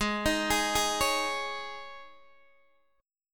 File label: Ab Suspended 4th, Guitar Open D